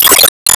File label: Gimmick Framewall Hit